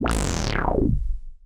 FX, retro house

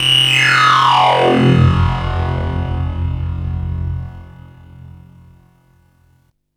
FX, Drum & Bass

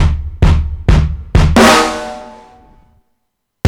kicks, drums acoustic, 130bpm, metal drum; musicradar-metal-drum-samples

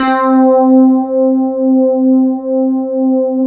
C3 DPF

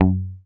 HOFNER MUTE, Bass

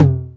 DR. LOOP, DRUMS; X-Static Goldmine 3

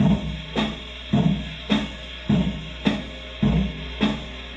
Loop, rhythmate, classic machines

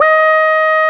QUAC, QUACK SOLO, Synth

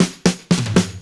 Drums Intro, 70s Analog Funk; Sonic Foundry (Sony Creative Software); What it Is! 70s Analog Funk

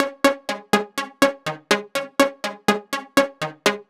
Bass, 123bpm, Nu Dance Electro; Combi Loops; Zero-G; Soundclash; Acidized Wavs; Pet Shop Toys

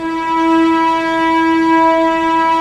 STR, Vcs p Amb

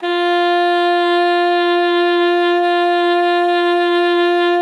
F4, ALTOSAX; Xsample VOL-2; 90 s Sample CDs

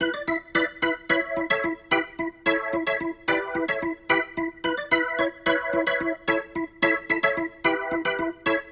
Rotor Riff, Organ

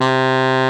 BARI C4; Greytsounds - Scott Peers Sound Engineering

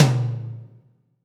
TOM, AMBIENCE TOM

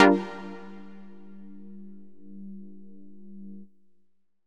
stab space, stabs

